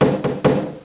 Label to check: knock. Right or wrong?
Right